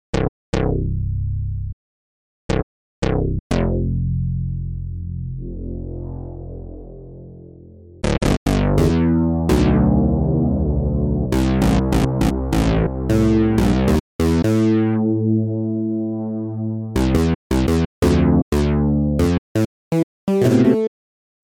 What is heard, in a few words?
Padshop 2